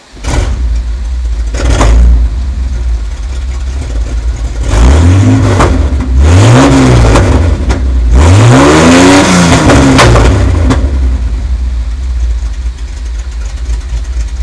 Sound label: open header 292, open headers 292 I-6, Chevrolet C/K Pickup